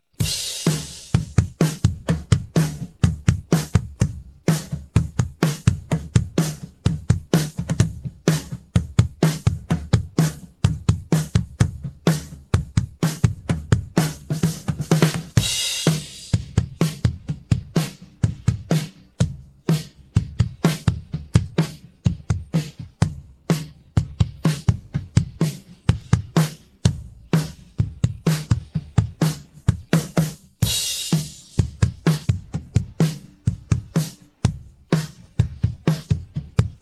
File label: drums